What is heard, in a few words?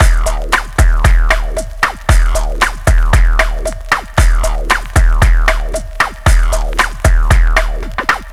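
drum 115, Bossa Back 115bpm, Breakbeat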